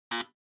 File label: Electromagnetic Buzzer, Dry